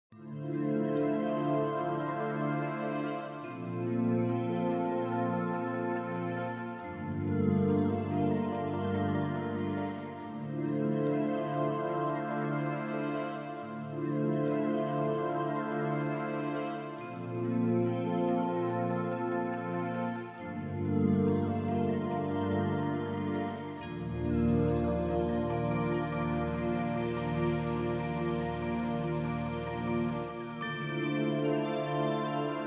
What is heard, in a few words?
Bells; Bells One